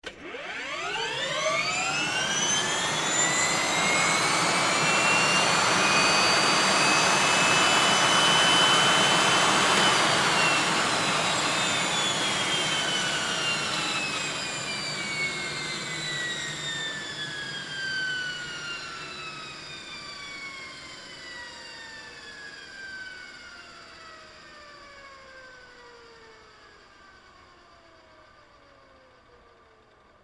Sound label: Helicopter Run, Aircraft, Helicopter, Engine, Start, Up, Shut, Off, Rear, Perspective, Hh-65, Dolphin; Transportation; Helicopter Sound Effects; BlastWave